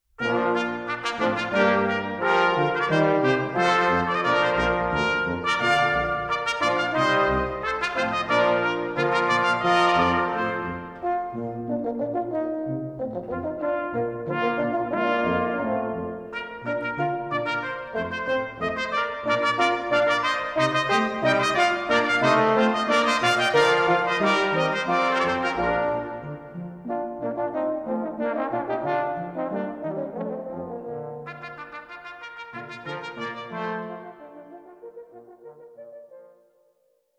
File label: trumpet voluntary, brass bands; boyce